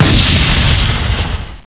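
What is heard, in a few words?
shotgun; QUAKEC; WEAPONS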